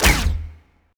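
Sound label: weapon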